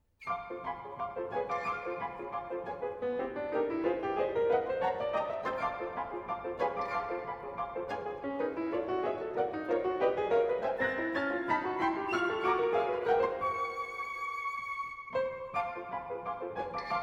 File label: Concerto for Piano and Orchestra; Mussorgsky; Pictures at an Exhibition; L Leonard; Geoffrey Simon